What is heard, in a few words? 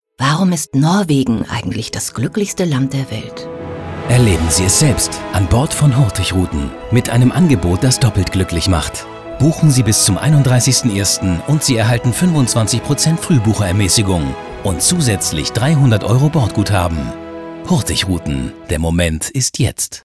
Funk; Hurtigrouten